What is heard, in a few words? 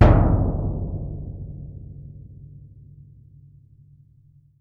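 bass drum, Percussion; Miroslav Vitous - Percussion & Harp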